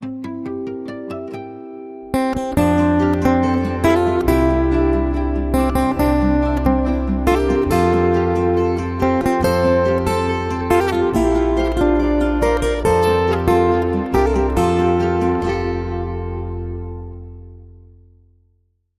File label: Acoustic Guitar Happy Birthday